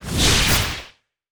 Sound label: reaction